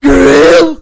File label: grill